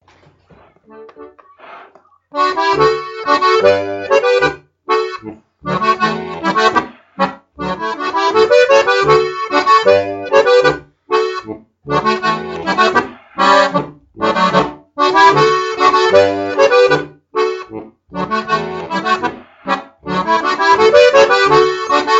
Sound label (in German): Boarischer